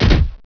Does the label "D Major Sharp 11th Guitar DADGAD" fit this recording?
No